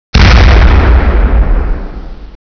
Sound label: kabam